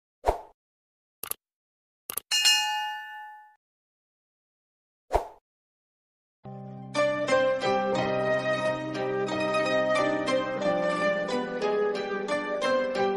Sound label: love bgm ringtone south indian bgm ringtone tamil ringtone; famous tamil bgm tone